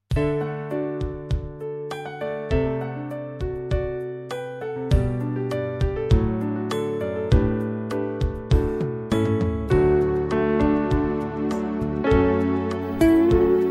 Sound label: ave Maria instr